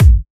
Kicks

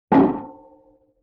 Wood Hit Mic In Bucket; Vintage Cartoon; Drums & Percussion

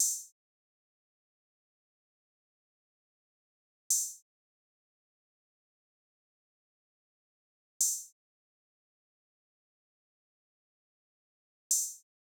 Drum Loop 123 BPM Open Hat, Hip Hop; Drum Loops; Loop Stems; Cymatics; Guard Drum Loop

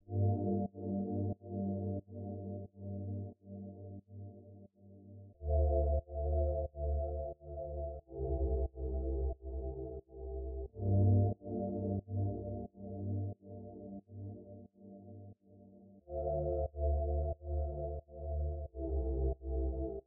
90 BPM D Min Pad; Melody; RnB; Loop Stems; Cymatics; 2022 Melody Collection; Lost Touch

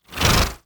Break Mono Jolt

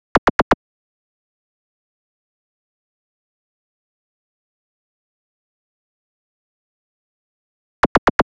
Drum Loop - 125 BPM FX Laser; Cymatics; Antidote Drum Loop

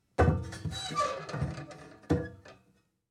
Jail Door Squeak Open; Fairlight Sound Lib

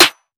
Power Snare - D; Cymatics; PHARAOH Beta Pack; Pharaoh Premium Drum Samples (BETA)

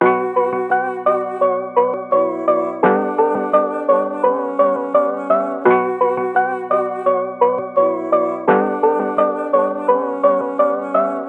Melody Loop 170 BPM B Min, Hip Hop; Melodics; Melody Loops; Cymatics; Cobra Hip Hop Sample Pack

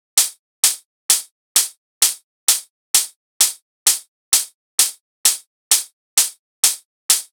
Hat Loop 130, Techno

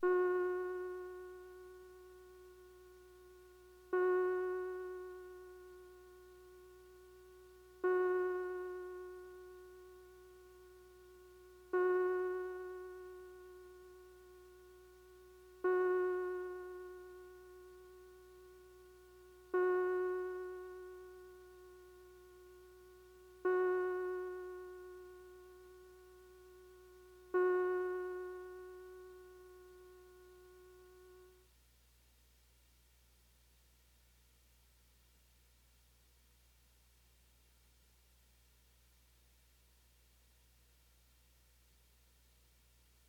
123 BPM A# Min Tape Key; Loop Stems; Cymatics; Completely Falling Apart

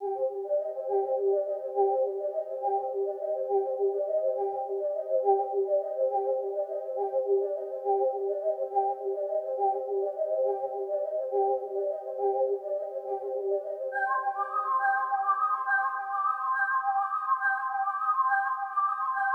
138 BPM C Min Keys; Trap; Melody Loops; Loop Stems; Cymatics; Nightmares; Devil

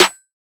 Snare; Modern Drum Kit; Common

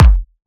Techno Kick; Advent Calendar - Day 6 - Techno Kit; Kick One-Shots

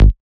Lili Bass G#

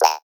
Perc, Psytrance; Hats & Percs

